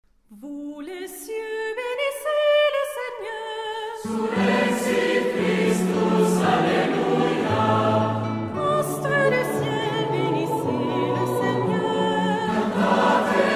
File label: surrexit christus solista